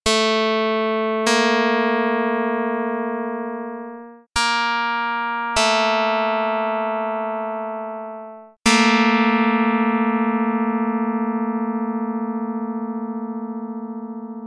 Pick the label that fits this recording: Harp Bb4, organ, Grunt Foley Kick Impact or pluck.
pluck